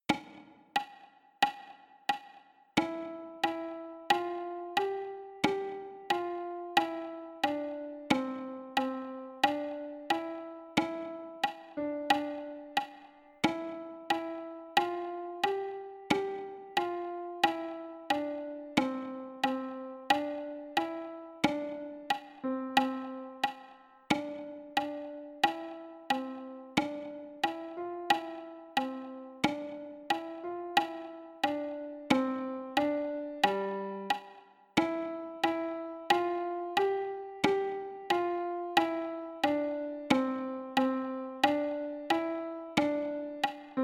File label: metronome 90; Hymne à la joie